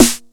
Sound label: 909 SNARE; Kid Nepro; Elite